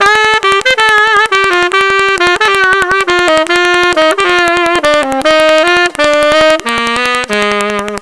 Sax; Sound Factory; Cool Licks

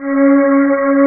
strings; OctaMED SoundStudio V1; jazzies samples

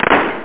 Claps

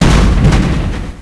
explo; aggress

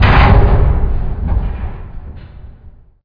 warehouse clunk; SpecOps2MPlayerDemo

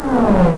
Blowers Off; Glider PRO

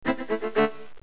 loop; Music Toys; kbot

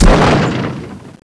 sun Cannon Fire; POOL CHAMP

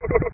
Walk

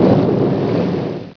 dive Bomb Flyer Die; F.A.R.M. Patrol

